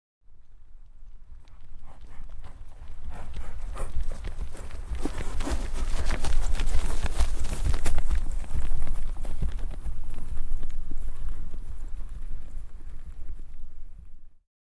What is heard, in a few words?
Single Horse Walk By; Historical; Hollywood Edge Historical Series; War Horses Armies & Weapons